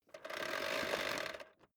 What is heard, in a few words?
vacuum cable pull in slow